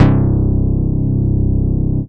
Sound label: BASS SYNT; Big Beat Samples & More